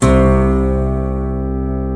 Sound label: 12 String C1, Twelve String Guitar